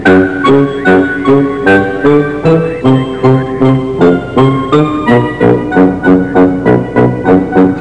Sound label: duet